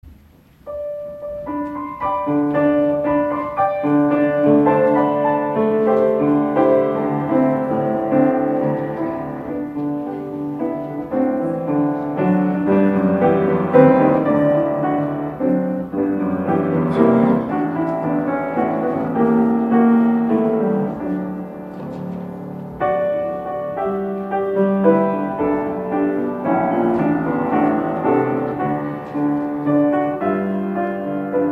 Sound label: Offertory